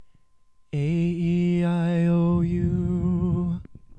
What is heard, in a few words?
aeiou Mid